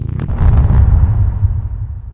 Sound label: citadel cloud hit; ambient; city; source engine; episodic